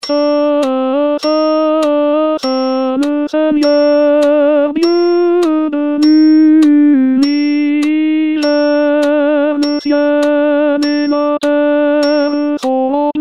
Sanctus Tenor, Chorale; Paques

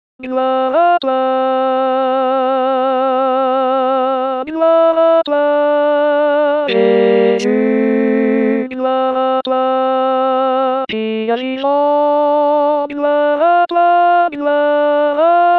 Amazing Grace Alto

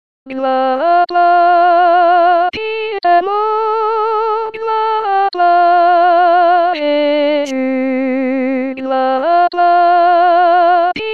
Amazing Grace Soprano

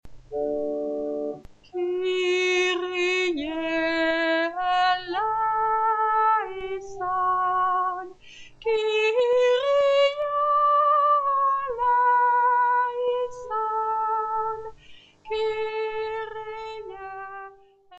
Kyrie Soprano

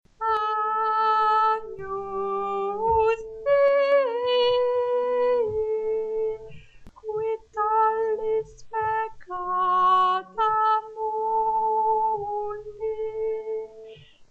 Agnus Tenor, Chorale

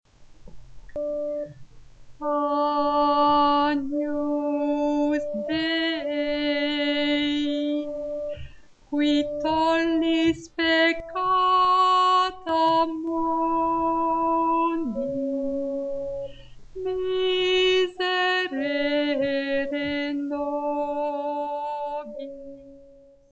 Agnus Alto

